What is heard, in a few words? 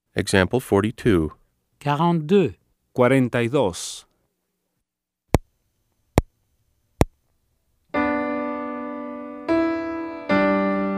Keyboard